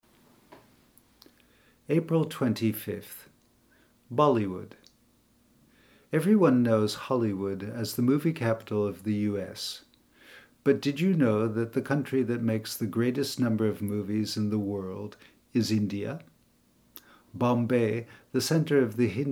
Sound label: bollywood